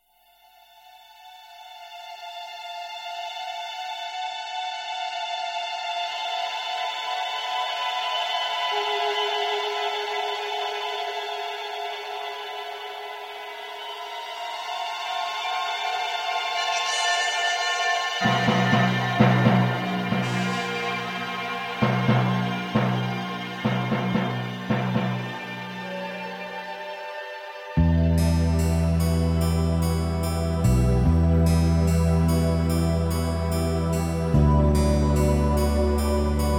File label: brass minus